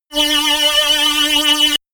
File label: shot annoying tooth; zaps buzzes